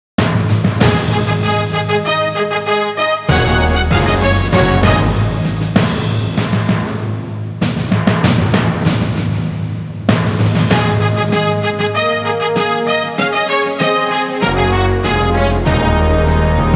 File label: Acoustic Rhythm